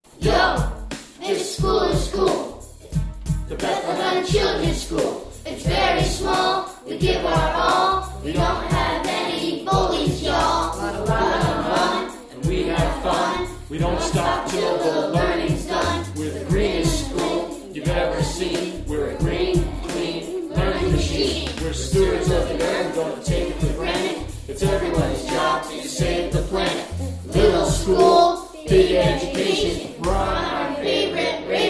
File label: children's school rap; bethlehem